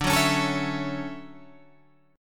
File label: D-Major 9th D down Guitar Open G